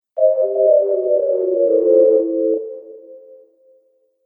Scifi Game Fail; Video Game Sound Effects

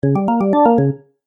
Organ Game Music; Cartoon Music Sound Effects

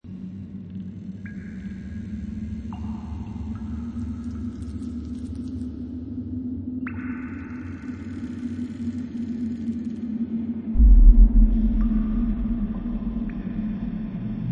Horror Scifi Soundscape The Dungeon Cave Loop; Horror Scifi Soundscape ambience: Fantasy horror dungeon; Scary Soundscape

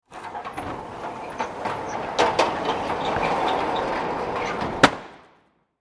Industry Warehouse Door Close, garage door; Garage Doors

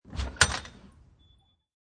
Open Front Door, Front door is opened.; Miscellaneous Door Sound Effects

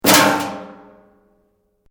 METAL BOX; Metal (Sound Effects:Materials)

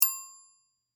Music, Bell, Ring, Muted; Bell Sound Effects; Musical

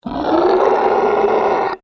Monster Groan, Horror, Monster, Groan, Creature; Monsters; Blastwave

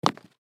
High Heel Shoes Drop; High Heel Shoes Drop Carpet; Foley; Blastwave

